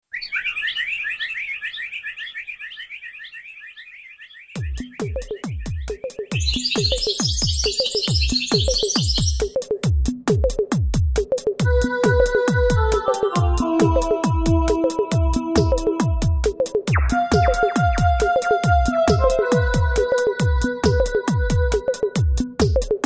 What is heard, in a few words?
Bird Song Beats